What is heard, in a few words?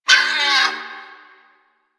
Creature